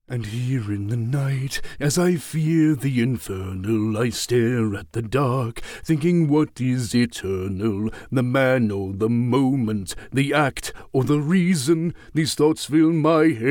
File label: Singing